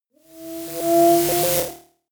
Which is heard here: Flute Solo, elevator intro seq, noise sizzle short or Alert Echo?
noise sizzle short